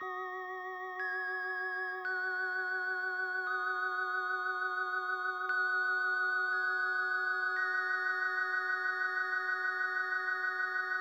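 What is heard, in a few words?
organ, Hip Hop and R&B Drumloops; Best Service ProSamples vol.01; AKAI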